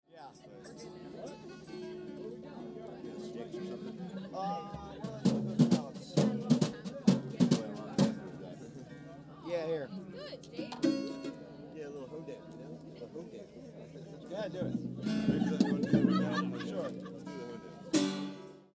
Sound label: fx drum laughing